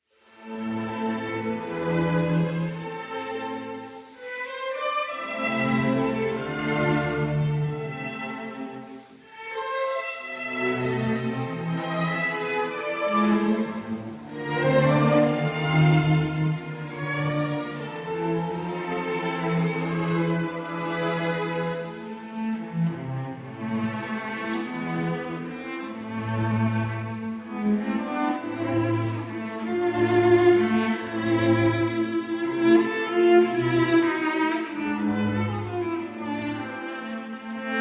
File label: Arpeggione Sonata in A Minor, For Arpeggione & Orchestra, Allegro; D. 821; Moderato